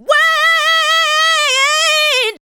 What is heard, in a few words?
ADLIBS